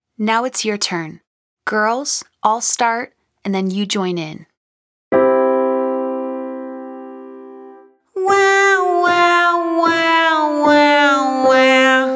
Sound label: Wah Wah Wah Descending 5 Note Scale Female Version